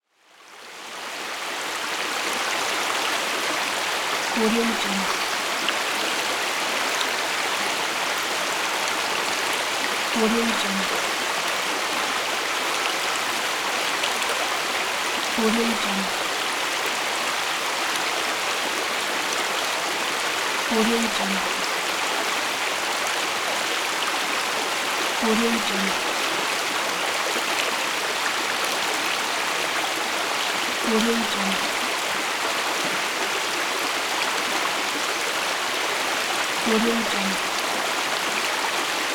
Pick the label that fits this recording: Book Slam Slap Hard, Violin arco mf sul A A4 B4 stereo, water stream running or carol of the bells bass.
water stream running